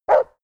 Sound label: border collie bark; natur-tiere; hunde